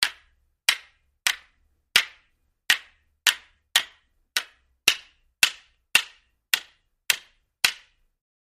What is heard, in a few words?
Hammer On Rock Light; hammers